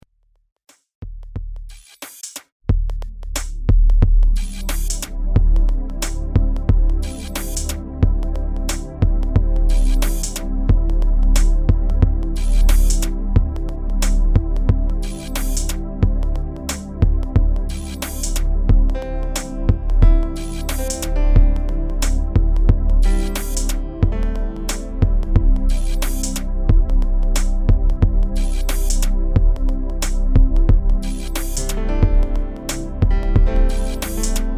pads; babbleon

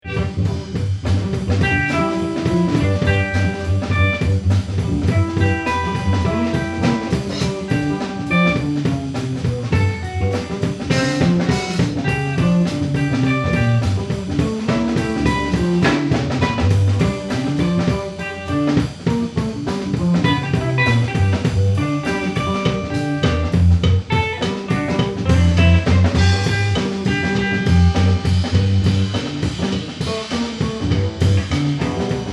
killer bass

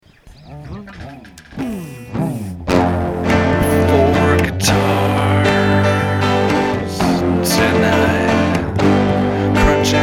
four guitars; leave-a-trace

